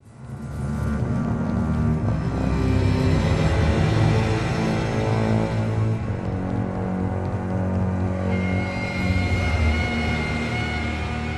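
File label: sting, string; transformers war for cybertron gamerip; MATRIX OF LEADERSHIP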